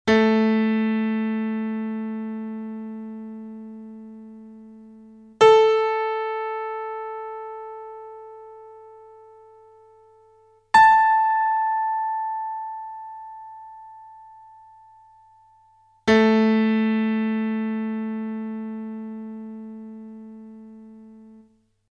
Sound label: Tunning Notes - Accordatura 440; Basi Musicali